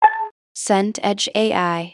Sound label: violin pizz